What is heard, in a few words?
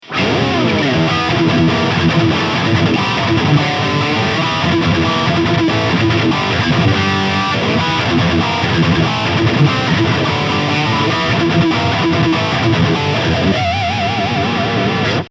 Cort KX300 High Gain